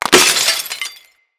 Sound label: Container Open; Morphics; StorageContainer; Material Specific Loot Containers